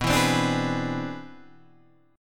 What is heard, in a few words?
C Augmented Major 9th B